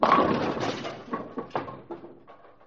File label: bowling impact